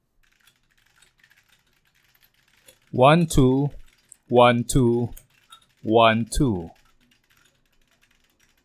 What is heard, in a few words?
audacity noise removal; graphics card